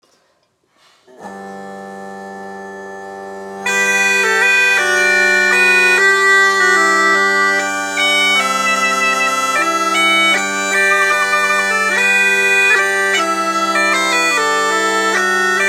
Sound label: Ruby Waltz, bagpipe